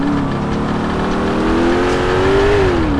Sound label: second, vehicles; emperor